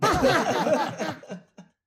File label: audience laugh